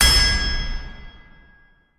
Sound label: Attack Hammer; endless world idle rpg gamerip 2019